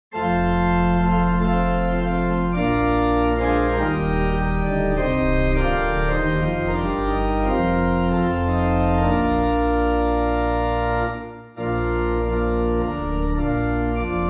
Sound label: organ; Angel From The Realms